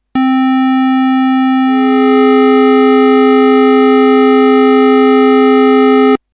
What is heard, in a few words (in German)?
kleine Sexte; harmonie